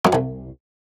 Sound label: generic error; Tom Clancy's Ghost Recon Future Soldier; orbit